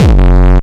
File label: BD Distortion, kicks; VEC1